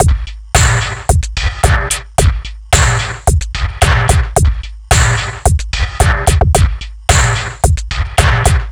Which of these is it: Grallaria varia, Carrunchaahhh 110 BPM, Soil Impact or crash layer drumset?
Carrunchaahhh 110 BPM